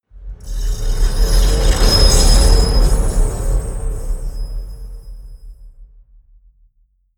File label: spaceship spaceship grind large